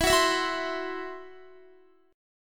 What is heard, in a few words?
E Suspended 2nd Sharp 5th E, down, Guitar; Esus2#5; strummed